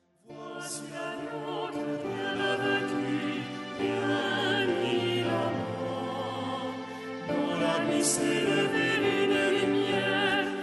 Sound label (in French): Venite et videte version chorale